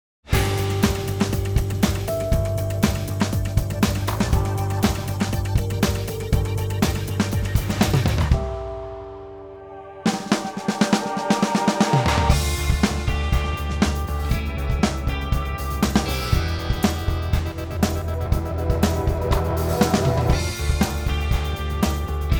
Full Kit Mics With Music

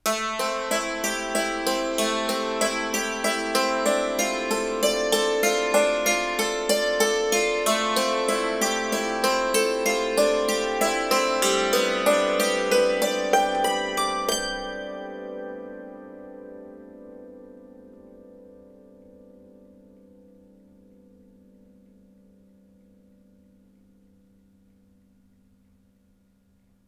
dulc chi icicle

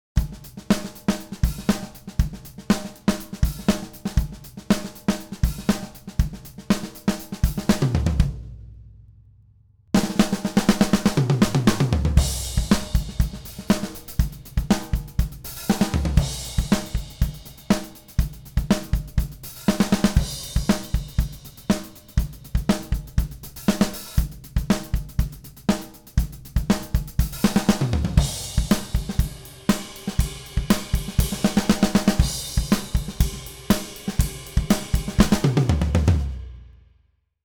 Full Kit Beta Mics No Music

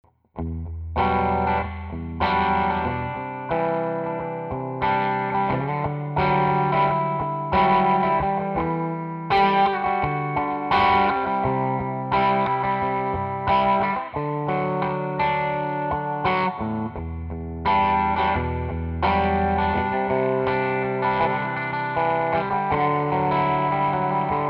electric

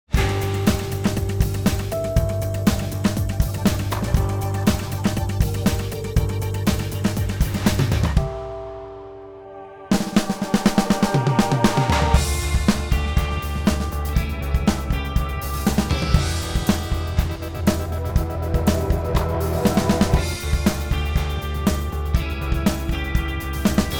Full Kit Beta Mics With Music

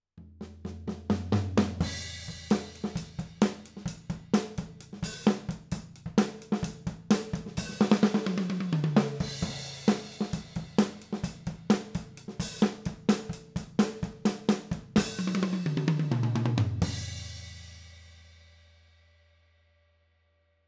drums

